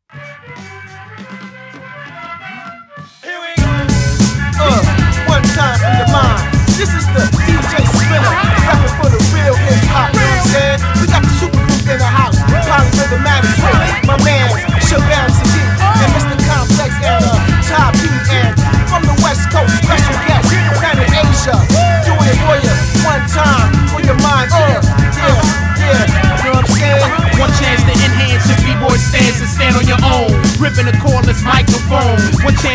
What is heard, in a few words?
polyrhythm break glass